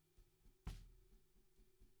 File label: SNR Bot